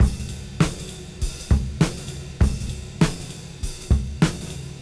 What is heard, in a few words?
Cym Beat 99 BPM Rev